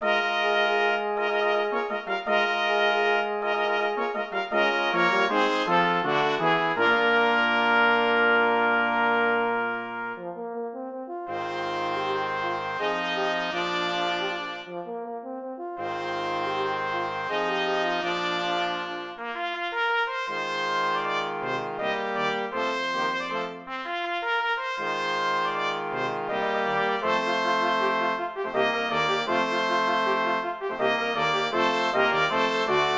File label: fanfare; la peri; dukas; decker; kendor music